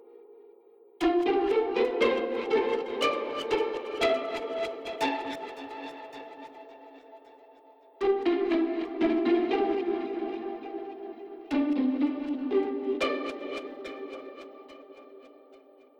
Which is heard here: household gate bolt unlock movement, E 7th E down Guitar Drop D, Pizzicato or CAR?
Pizzicato